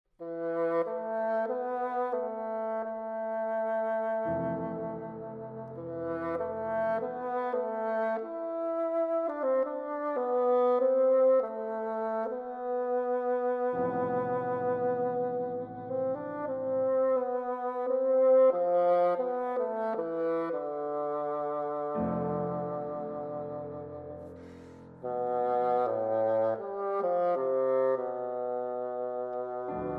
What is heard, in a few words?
Sonata For Bassoon & Piano - Mvt. 1 Andante sostenuto